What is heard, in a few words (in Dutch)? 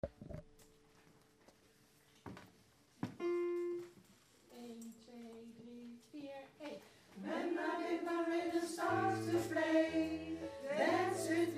altpartij